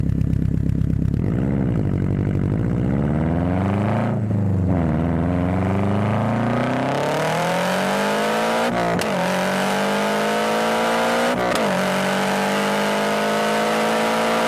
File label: Subaru Boxer 4